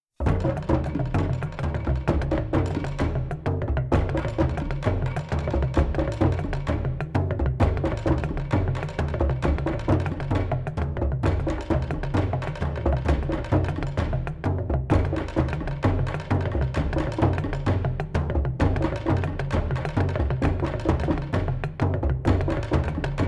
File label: Distant Drumming; Return to the Blue Lagoon